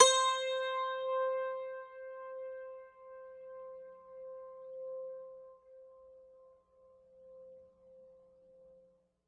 zither C5; multisampled; world

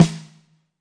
real; REAL LIVE KITS; A Real Freakin Drum Kit

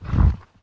Foam Panel, Found Percussion Hits; ModeAudio Found Percussion Hits

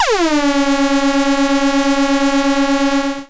SH101 Tone; SH101 Tones; SYNTH KEYS

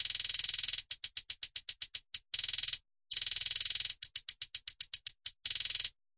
hip hat trap hh, drum loops and breaks, 077 bpm; 000 to 080 bpm

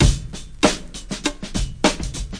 groove, drum loops and breaks, 100 bpm; 091 to 100 bpm

